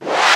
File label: Short Fills & Reverse; Vengeance Samples